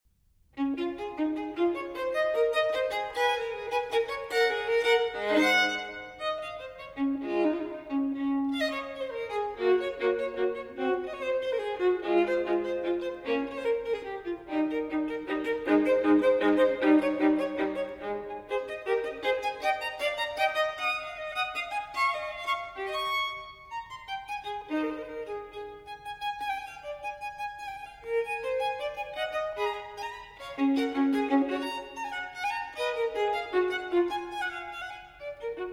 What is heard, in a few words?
Solo Violin in D Major Vivace; TWV 4017; Telemann Fantasia